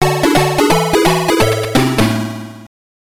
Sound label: Death; Smurfs the Game Gear